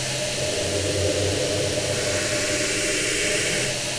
Sample n Hold Verb Loops, sparse soundscape

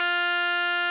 RMI EP; Sonic Reality - Vintage Timetraveler

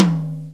TICTALK DRUM

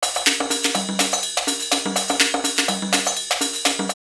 R8 Jazzy 124; drumloops; DrumMachines; X-Static Goldmine

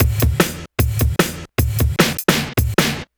drums, Hardcore Breakbeat, 151; Big Fish Audio; Hardcore Breakbeat Action; Rhythms 4 Life 151 Ebmin

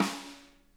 snare rim, drum kits

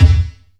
Strange Kicks, Percussion; Dance Synths

